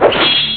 Slash; Regicide v8

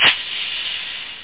shaker; totalrecall; Amiga 8-bit Sampled Voice

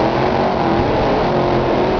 Top Fuel Critical stop; VoitureCourse

